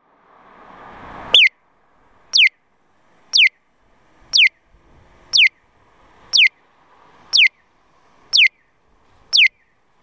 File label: piyo, onkyo kosaten; aichi; moriyama; hyotanyama; nagoya U-TP-1